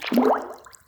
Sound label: Swim Variation